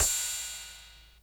Hats Electro Open

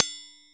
poik, Triangle; Misc Percussion

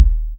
Kicks; Medium

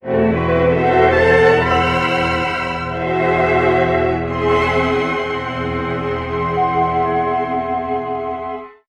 strings, Sad Loops

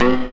plunk, stabs

